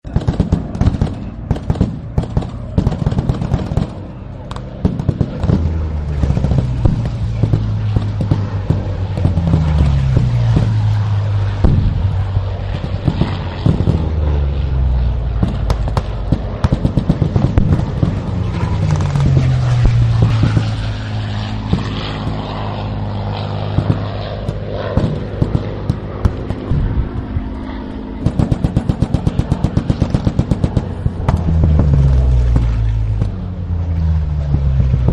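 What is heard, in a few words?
WWII Battle Ambience, Battlefield; Field Fire; War; Wwwll Battleambience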